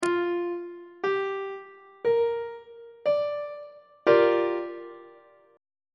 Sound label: f double sharp minor 7th 3rd inversion on treble clef